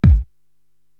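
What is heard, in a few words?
bass drum Circle, Korg TR-Rack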